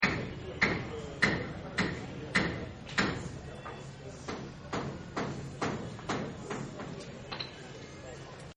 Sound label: Hammering, construction; Construction Sound Effects